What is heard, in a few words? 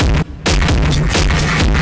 drum loops; bb drum loops